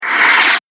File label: Magic Disappearing Whoosh